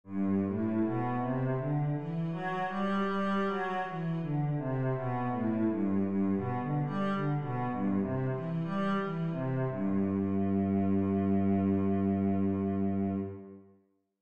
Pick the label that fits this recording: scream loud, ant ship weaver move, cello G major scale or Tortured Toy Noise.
cello G major scale